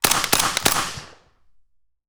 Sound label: Enfield L86 LSW 5.56mm on gun Triple shots; DPA4062